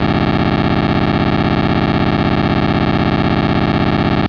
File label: TONE; XLit 0.16; PC Atari Emulators Old